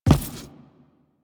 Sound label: Footstep Default